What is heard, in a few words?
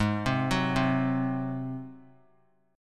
Ab 7th Ab down; Ab7 arpeggiated; JGuitar